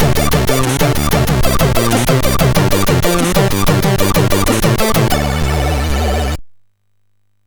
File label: Game Over sid MOS6581R4